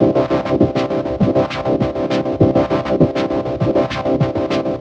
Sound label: Organ train; орган